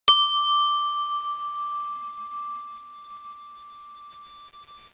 chime

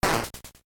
lose transformation

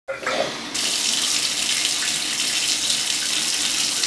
shower, banheiro; casa

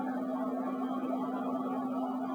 mans deep laugh

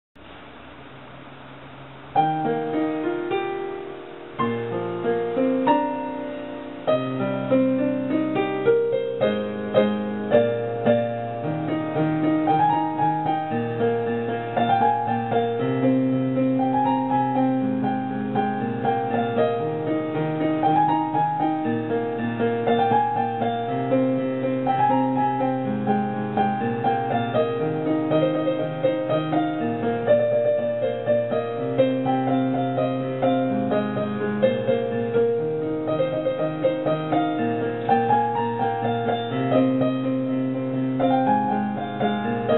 Piano Cover

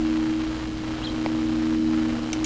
Buttonquail

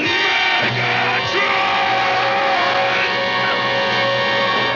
screams

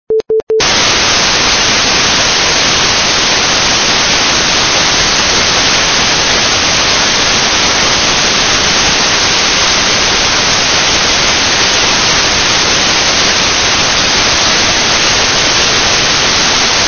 white noise

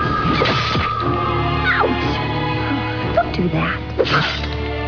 ouch

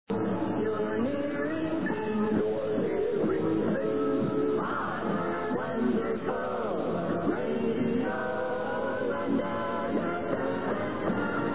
jingle

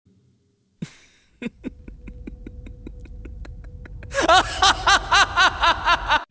Knives laughing

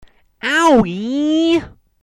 pain

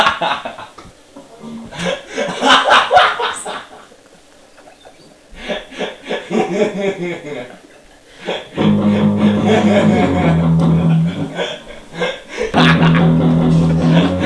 laugh

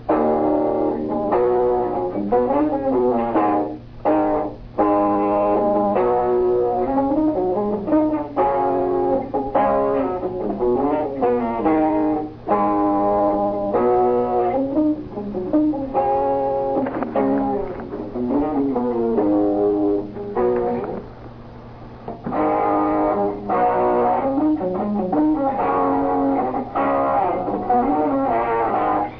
Riff

